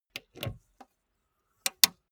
car bmw e46 exterior gas cap open

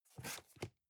cardboard box close fold single; objekte

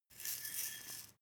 herbs tilt; soundpool

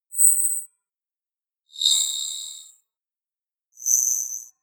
beam

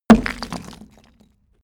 stone hit shatter; natur-tiere; steine

